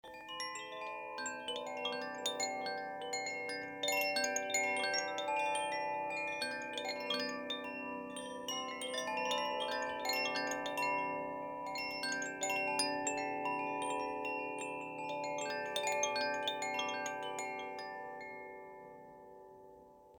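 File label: koshi ignis aria, carillons Koshi; carillon koshi feu; tresors esoteriques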